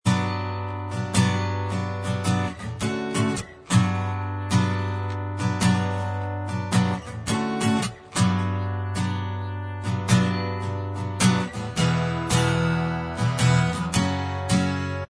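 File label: acoustic Instrumental Track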